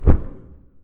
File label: poof